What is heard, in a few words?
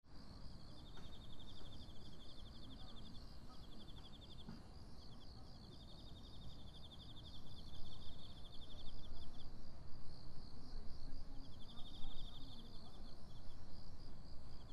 sinobuyama park insects